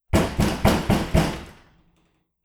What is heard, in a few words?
five thumps; sound effects and sound reinforcement; The Passion of Dracula